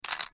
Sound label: camera